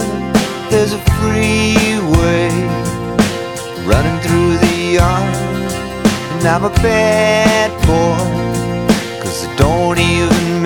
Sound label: Workout playlist for running 170 BPM